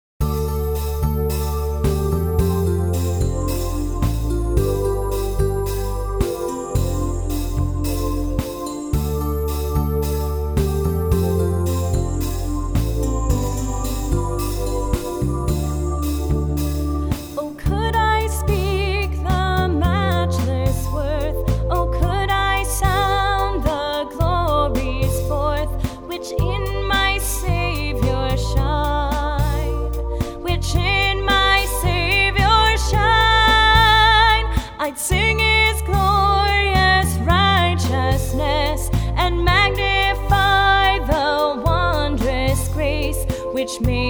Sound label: Vocal, hymns; O Could I Speak The Matchless Worth